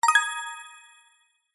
multimedia game sound mobile game warm positive twinkle attention alert